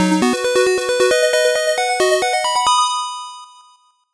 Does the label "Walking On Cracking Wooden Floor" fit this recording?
No